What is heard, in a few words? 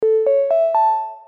notify